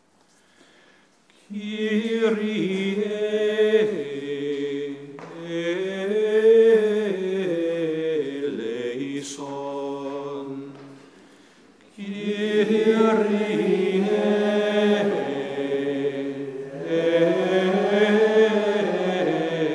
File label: kyrie no organ